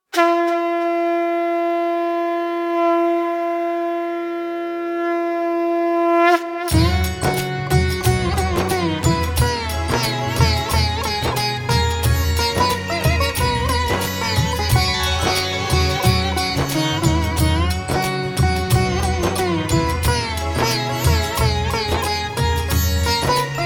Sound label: Aarti, Bhajan